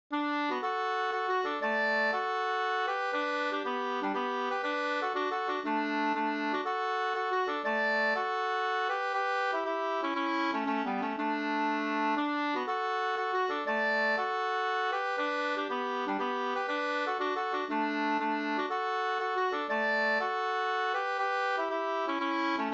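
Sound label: polska, two-part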